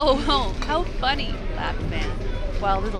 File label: noisy